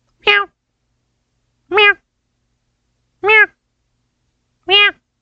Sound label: meows